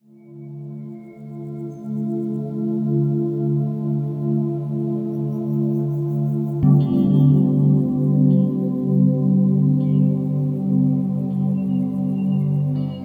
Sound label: mysterious forest